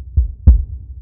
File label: heartbeat